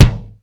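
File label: 2 HEAD KICK 2; Hollywood Edge; The Big Bad Beatmachine; HR-16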